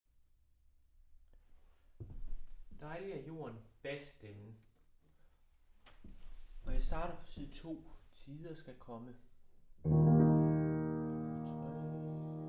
bas

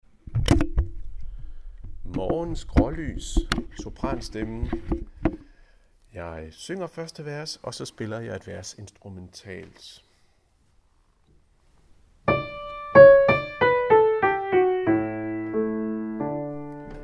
sopran; Morgenens grålys; harlev koret

